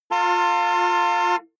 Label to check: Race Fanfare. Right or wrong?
Wrong